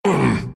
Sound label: grunts, ru